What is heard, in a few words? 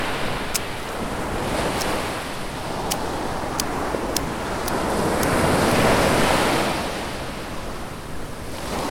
foot steps on the wet sand near the whirling sea; soundscapes